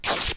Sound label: Tearing material